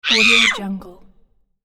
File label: child scream in pain